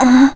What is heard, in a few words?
Link Dozy; Link half-asleep